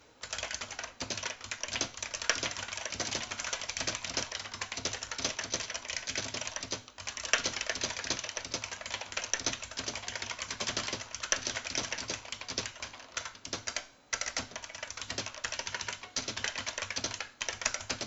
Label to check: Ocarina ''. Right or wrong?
Wrong